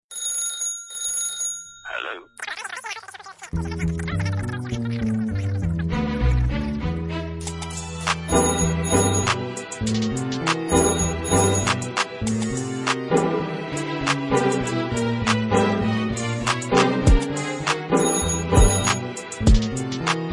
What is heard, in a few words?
pizz, viola; hey presto for viola book 1 bronze